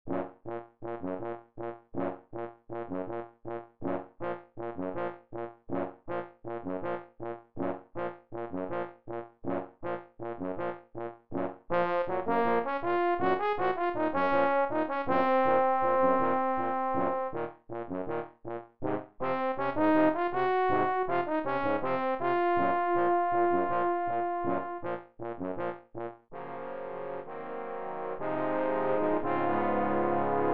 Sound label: trombone quartet